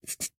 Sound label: ui lock champion confirmed; jade; champ select